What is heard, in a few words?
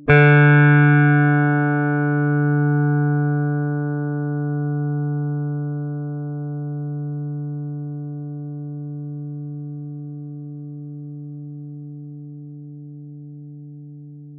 clipping